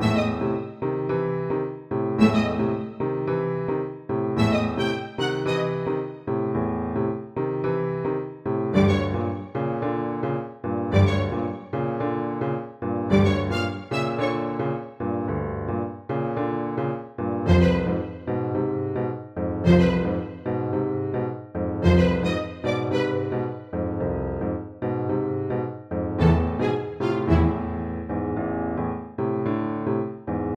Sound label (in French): piano grave; picklejar